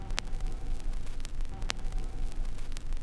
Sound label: Crackle Loop, Vinyl Noise; noise hiss crackle